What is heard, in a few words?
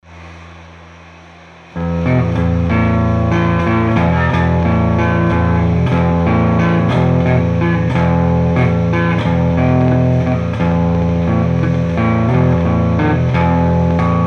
guitar